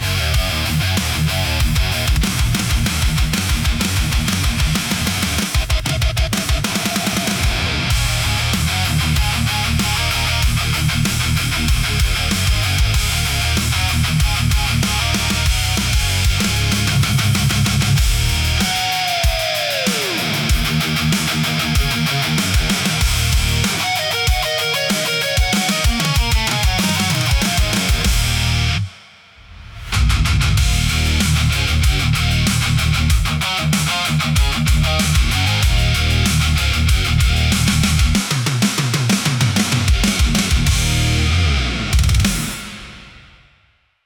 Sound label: hard rock for powerful